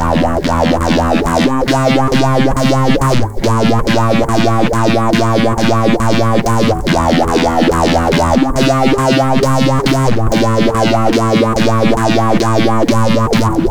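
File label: distortion phaser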